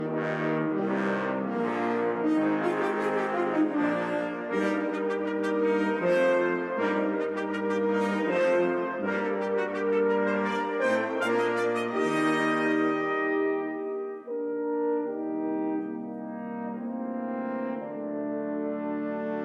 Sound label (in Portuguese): vento metal